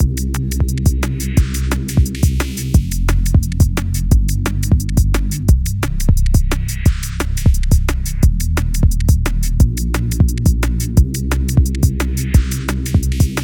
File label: wave n kick gen example; Doofers; Dunks Doofers